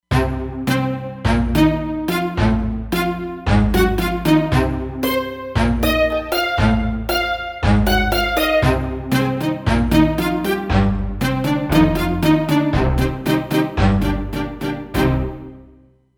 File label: Tutti, Roland Fantom XR